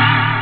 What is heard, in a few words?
Boot; Spacestation Pheta 2.4